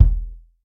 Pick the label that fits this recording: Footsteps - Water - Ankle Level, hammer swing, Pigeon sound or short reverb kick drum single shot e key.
short reverb kick drum single shot e key